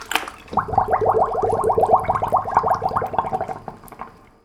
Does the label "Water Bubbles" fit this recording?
Yes